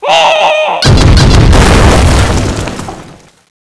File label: attack sliding; cstrike; Teddy CSO